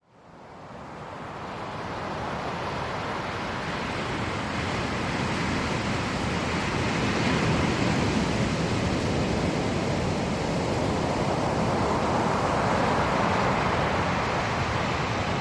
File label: ocean